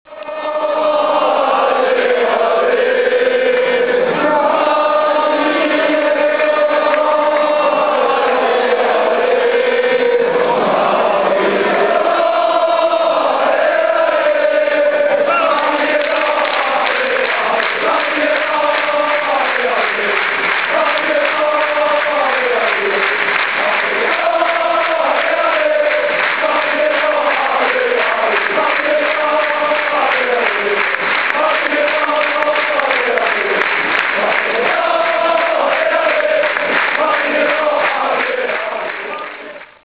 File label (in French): Maaaa rrriiiiii nneee blaaanc alleeeeez alleeeeez